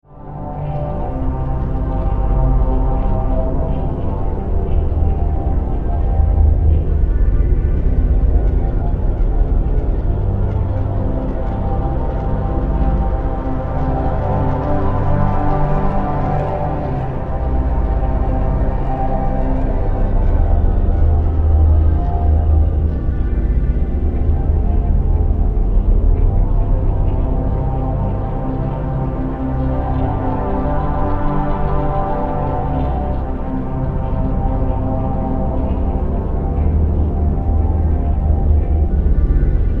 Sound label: Synth, Stab, Drone, Horror, 5.1